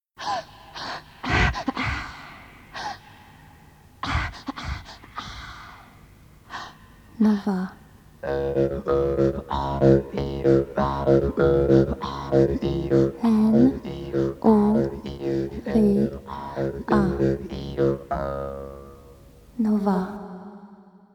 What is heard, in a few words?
Radio Nova Jingle; Nova 25 ans - 1997